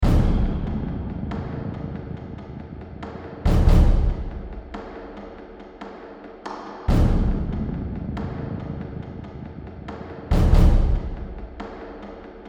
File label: Rifle Room Music; Hector Episode 2 Senseless Acts of Justice